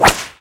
donkey pin tail

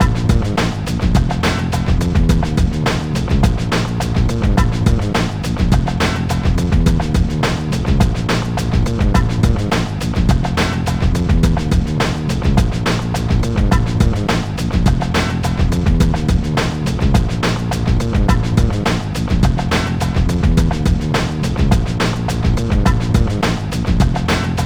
Load Loop; NBA Street; GC; PS2

